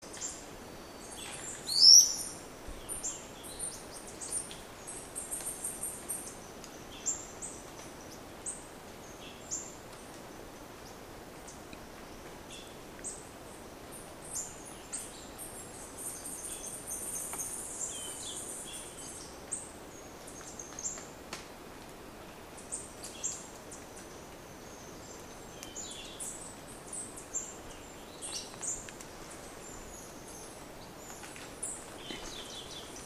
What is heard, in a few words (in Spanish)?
Picoplano pechirrufo Rhynchocyclus fulvipectus